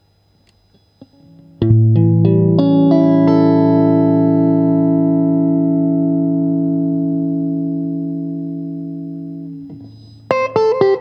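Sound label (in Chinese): 60S OCC 单晶铜 清音 2档, acoustic guitar; kindman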